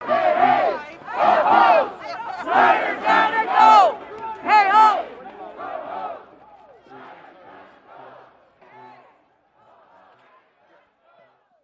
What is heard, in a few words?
Ambient